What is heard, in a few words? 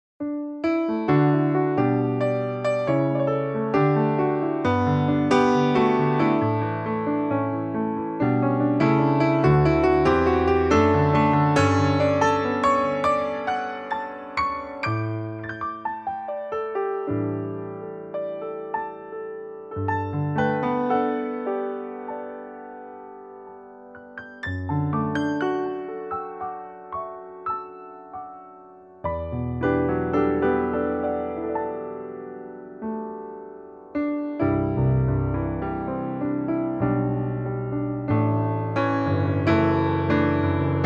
Steinway D